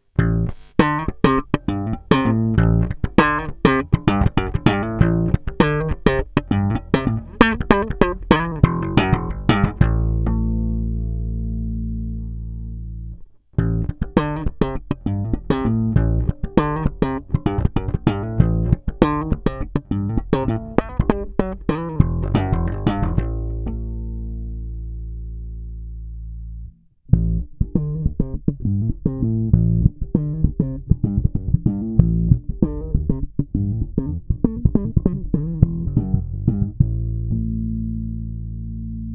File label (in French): Slap PB 57 en Flats; LaBella FS